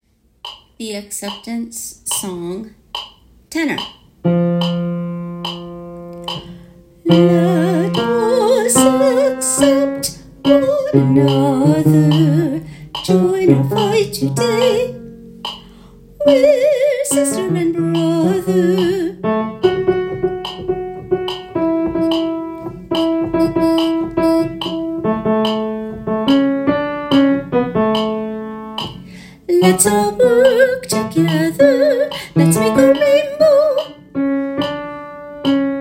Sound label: Tenor; Broadway Shabbat